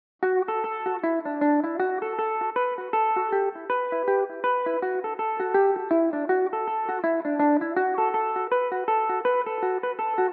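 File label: reel D major belmuse, irish mandolin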